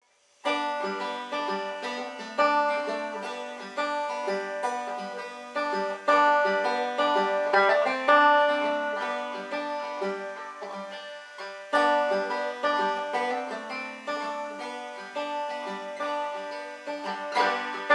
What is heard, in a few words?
banjo solo; cincinman